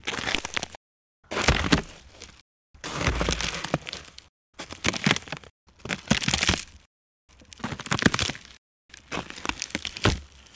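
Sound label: Wood Stretch Breaking Snow Ice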